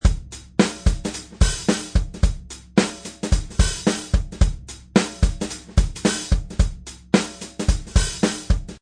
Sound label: Funk Drum Beat Loop at 110 bpm.; Drum Loops